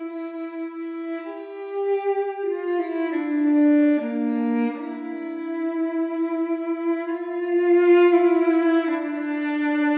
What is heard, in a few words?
duo synth multi oracle